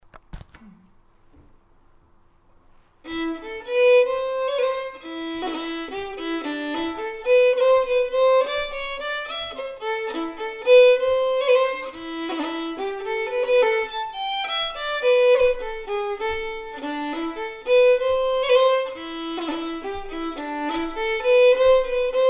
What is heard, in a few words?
jig, fiddle